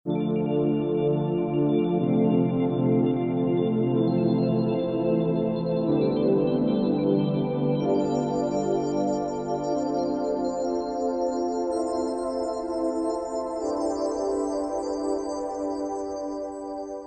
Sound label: House, vintage drum machine loops; Standalone Music; ableton